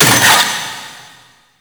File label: Vaults Stake Gate; Gameplay; Warframe; Entrati